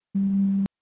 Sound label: interpretive tonal